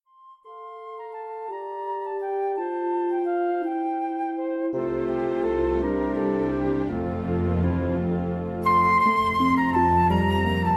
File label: Christmas Instrumental Solos